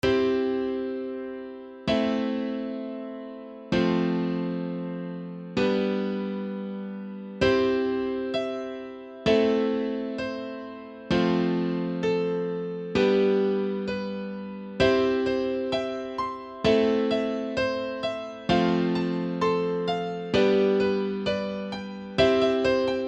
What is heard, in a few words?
Music Kanon Pachelbel